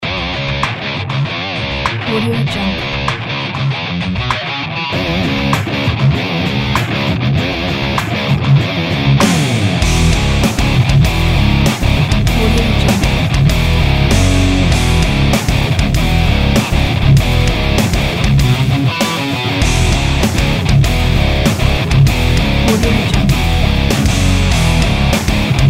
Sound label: alternative rock; trendingaudio